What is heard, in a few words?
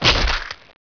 mushroom pop